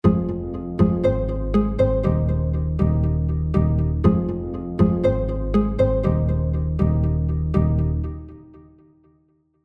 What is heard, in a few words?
Analog Strings Pluckhairs Rhythm; Output